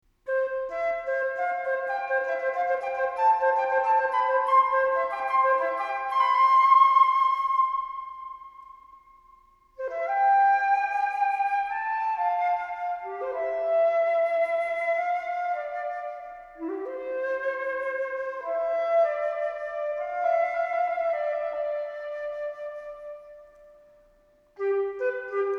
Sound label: Crystal Flute; Ringtone